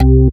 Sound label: Bass C, Offbeat Bass